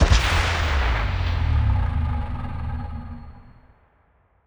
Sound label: continue Button To Free spin; netent